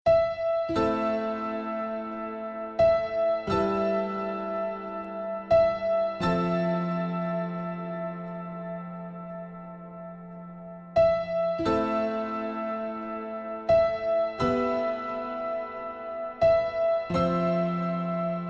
Piano Relax

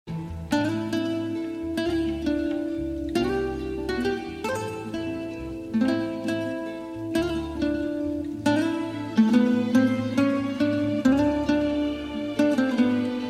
Perfect Light Guitar

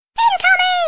Worm sound

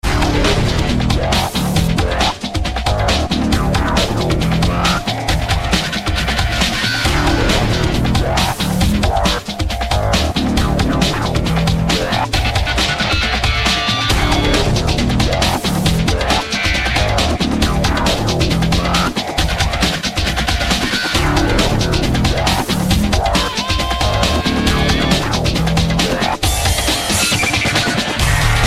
flame dance

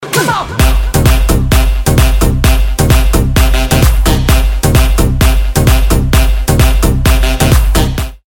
sax

